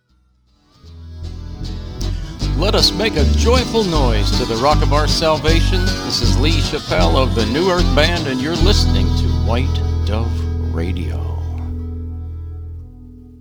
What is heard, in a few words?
Joyful Noise ID with music